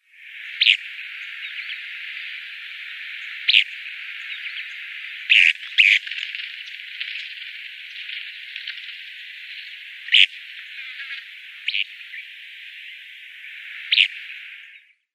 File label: Oriental Skylark calls